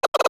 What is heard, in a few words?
Cucco; Cucco clucking; Zelda